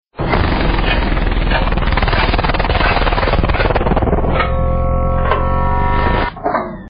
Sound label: time stop